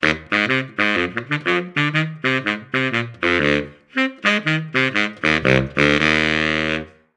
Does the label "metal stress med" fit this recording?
No